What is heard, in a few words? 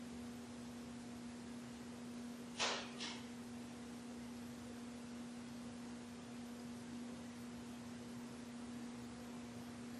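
Ballroom Static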